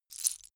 lego bricks small handling single

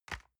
envelope drop multiple medium

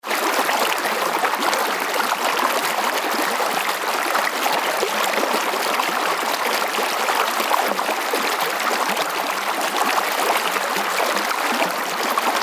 stream strong

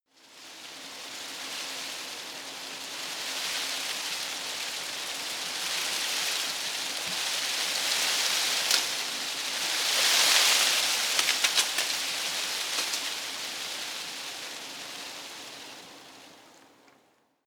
tree foliage rustle medium

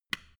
switch small turn on soft

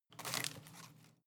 home plant leaves rustle short

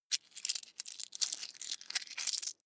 coins clatter soft

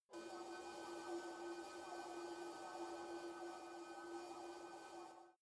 space alien fabric machine close settle

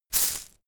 insect shaker hit double hard

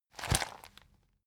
plastic bag hit medium

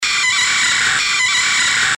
hi freq high cry distortion

